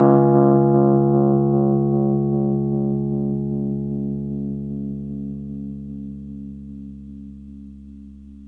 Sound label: RHSM C#, Rhodes; 3D Sound; 3D Keys VOL-1